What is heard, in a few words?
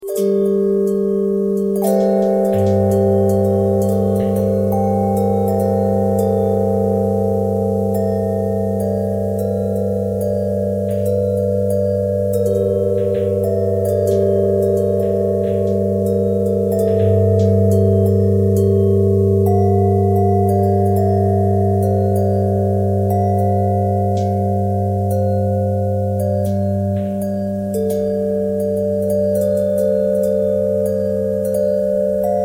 bell twist